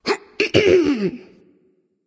nv clear throat; smite; voicepack; ratatoskr

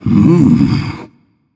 thinking; smite; anhur

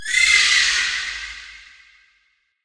ult shriek; smite; god cthulhu

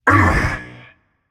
surprised, voicepack; smite; jorm

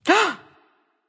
breath; smite; voicepack; hera

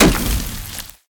impact fire; smite; vulcan

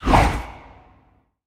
Swing; Smite; god Kumbhakarna

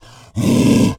zombie brute run; smite; npc; roman archer

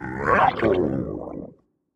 ward placed; Smite; bakasura

